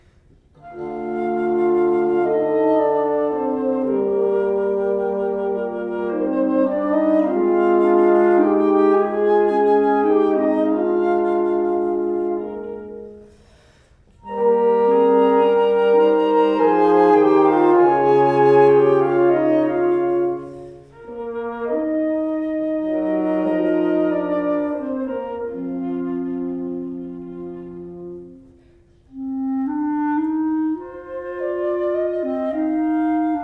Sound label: Wind Quintet No. 1, III. Andante; Szervansky